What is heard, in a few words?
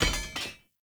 Shield Drop; Weapons; Enemy; Shields; Sinecure Shield